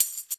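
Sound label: wood tambourine hit shake; RadioactiveSandwich - Homunculus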